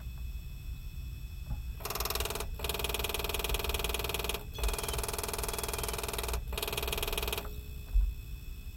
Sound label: clicking noise